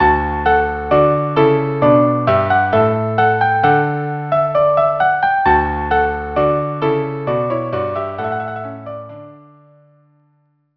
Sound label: March D trio; jacquet